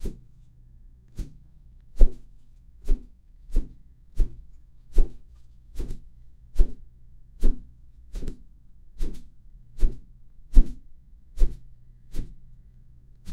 fist swinging at air; air movement

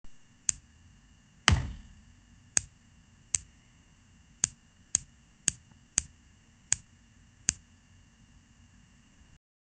the flash running, superhero

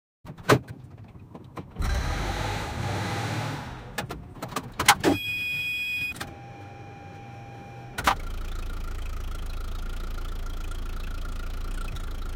old car wont start, starter

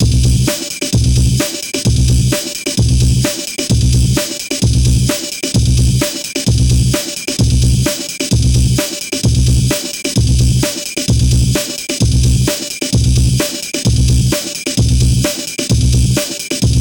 130 bpm drum and bass

